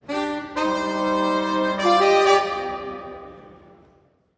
Brass Fanfare

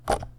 Quick Computer Mouse Scroll

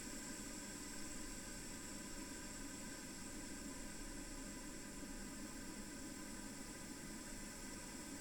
Air Hiss Weak

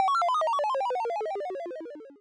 Lose Sound

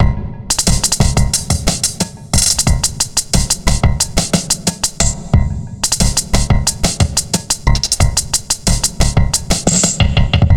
90bpm 4 bar Busy reverb congestion

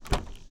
Car Door Open

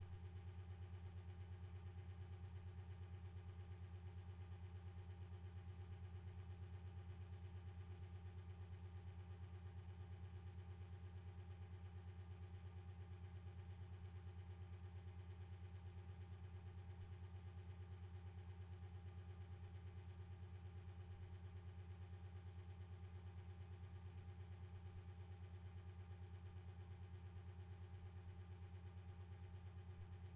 Electric Hum

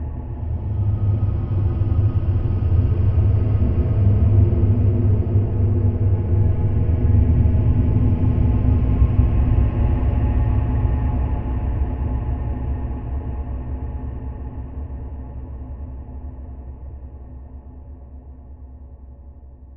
Dronescape

